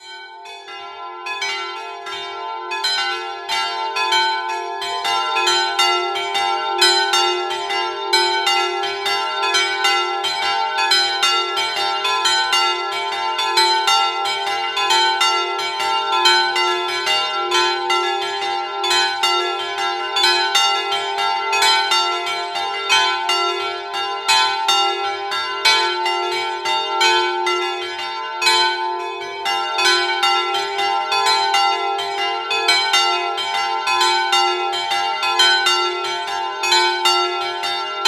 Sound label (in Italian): Colle di Pinzano, campane